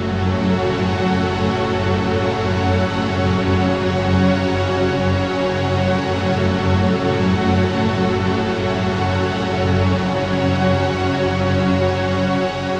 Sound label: Pad F# Analog; Trap and Dubstep